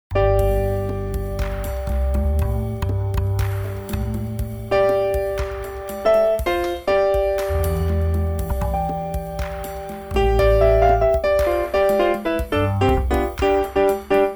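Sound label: JAZZ IMPROVISATION ON KAWAI K1 GRAND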